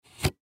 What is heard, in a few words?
Desk Lamp Unplug, Desk Lamp Unplug Slow Wall Socket; Household; Office; Lamps; Blastwave